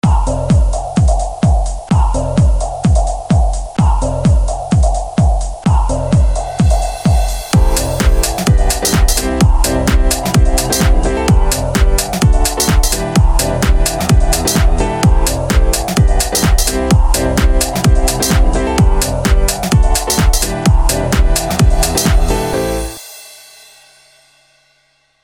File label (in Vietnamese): nhac nen video mo man chuong trinh soi dong